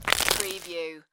Snow and Ice, Ice cracking

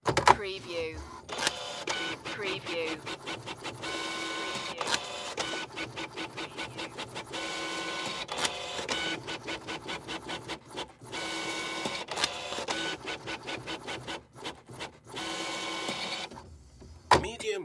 Printer Print Multiple

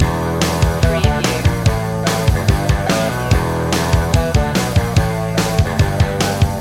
rushing loop

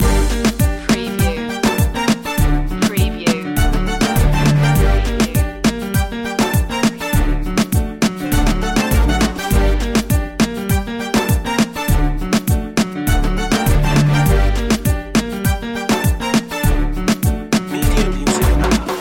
tiempo de sol loop

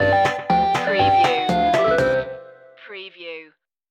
8-bit boss stinger

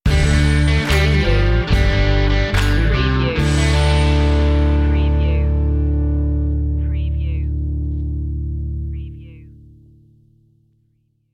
lonesome ranger stinger

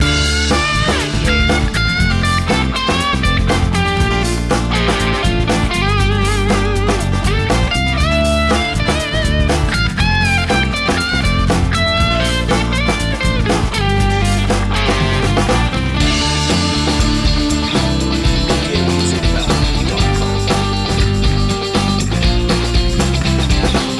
acoustic uptempo travel song loop

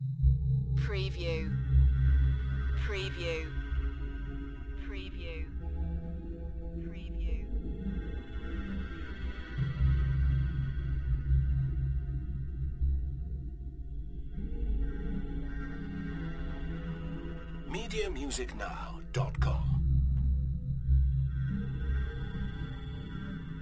Horror Scifi Soundscape

